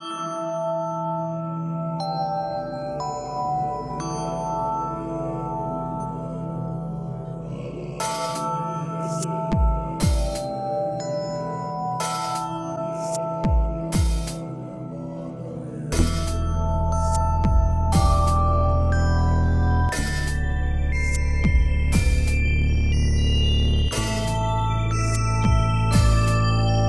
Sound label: Temple Prelude